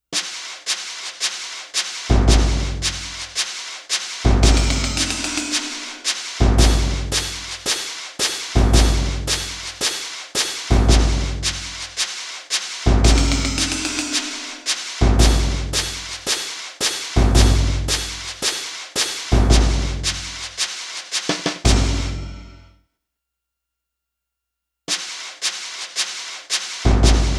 Drums Practice

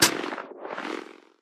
Propeller Bounce; Doodle Jump 2

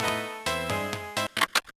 Switch

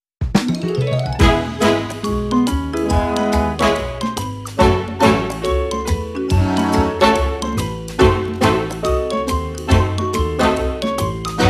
Intro 141bpm; Good Job

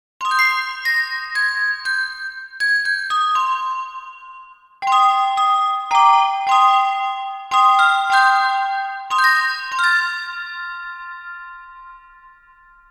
Song of Blessing ~ Instrumental; Rune Factory Tides of Destiny

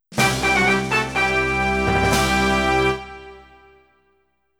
Tie Game Jingle; tokimeki memorial 2

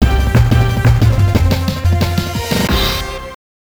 Sonic Jingle 2 (Stage Clear 2); Aero Fighters 3; Neo Geo; arcade

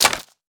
archer drop bow; Aragami

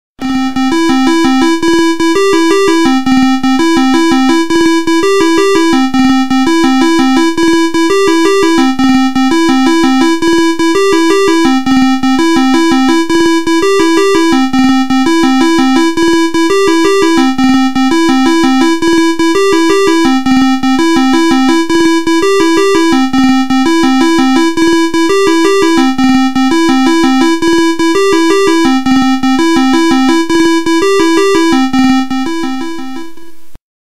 Hammer Power; Mario and Donkey Kong; Minis on the Move

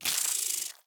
Spider Attack; Devour; The Inn

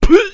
piglette damage; Angry Birds Space

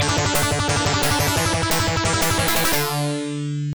All Clear; Castlevania; Akumajo Dracula; IBM PC XT AT

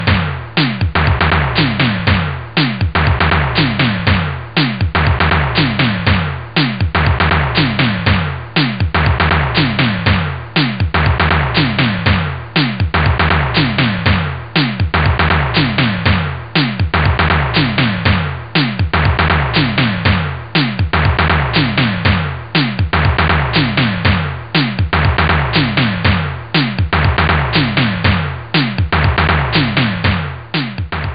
Toms Loop; The Town with No Name; Amiga

